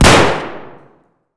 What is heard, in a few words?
toz fire indoor close; Escape from Tarkov